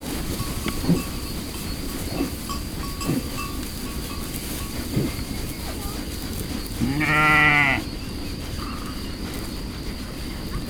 legelő nyáj, juh; állathangok; paraszt udvar

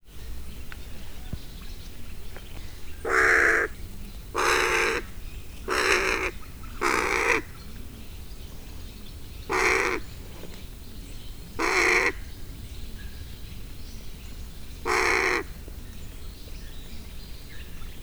földön lépegetve folyamatosan károg, dolmányos varjú; miskolci zoo 2009

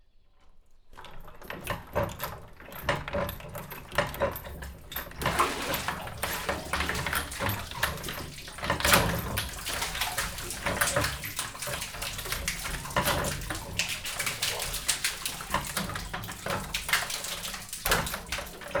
vodor felhuzasa, kerekes kut felul zart; vízház; cardioid

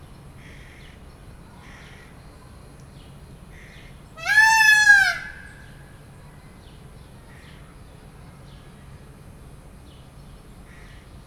szóló, páva; termeszetben

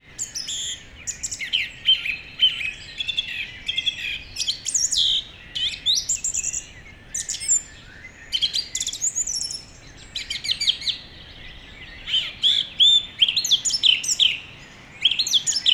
enekesrigo egerturistahaz; termeszetben

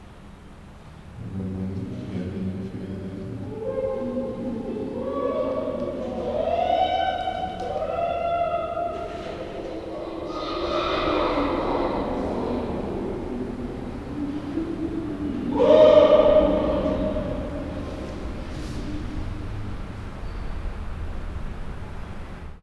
a két szélső csimpánz; allathangok; veszpremizoo 2013

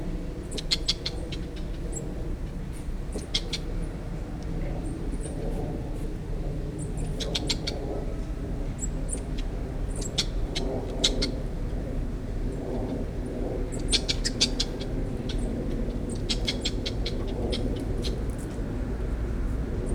ormányosmedve; jaszberenyi zoo 2016

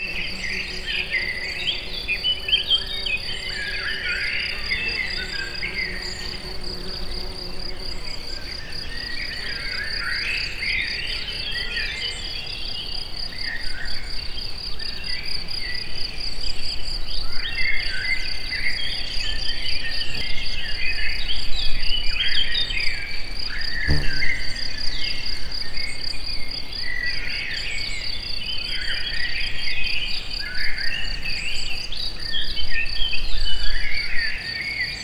egeszen kozel, rovarok; termeszetben; egerturistahaz

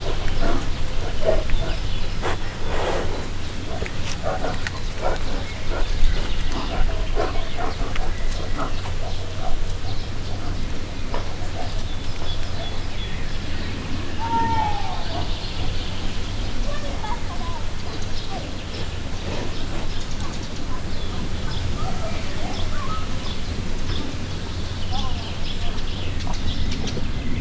ketten maszkalnak, barna medve; miskolc zoo

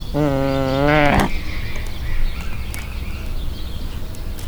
morog, holló; debreceni zoo 2012; marantz puska

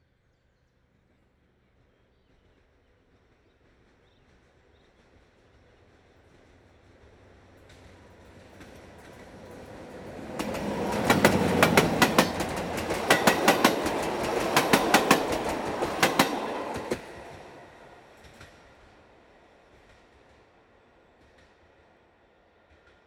a hangjelzesu sorompo oldalan, vonat atjaro; vizvar felol; vizhaz; cardioid

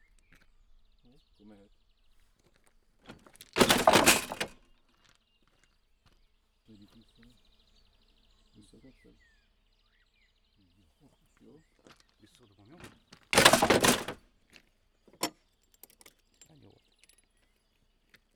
valto at es visszaallitasa, vasut kezi allitasu valto; vizvar; cardioid

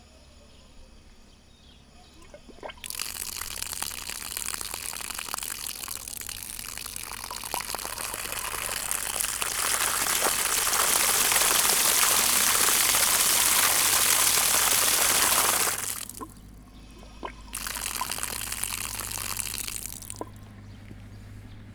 többszöri megnyomás, artézi kút; Szögliget

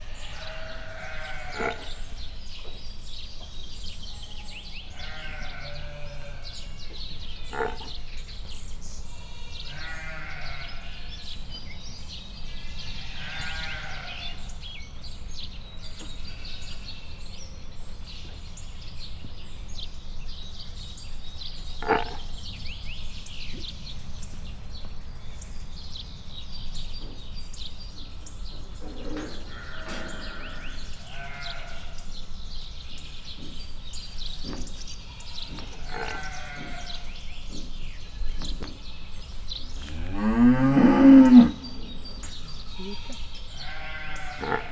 magyar szurke is, hazibivaly; miskolc zoo

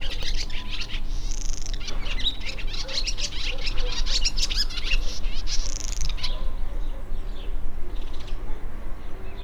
kutya is; parasztudvar

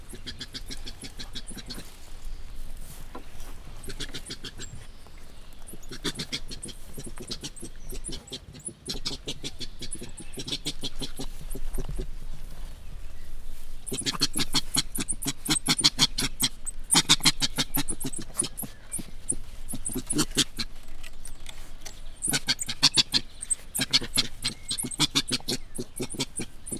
aggodalmaskodó hang behatoltunk a területükre, ormányos medve; jaszberenyi zoo 2015

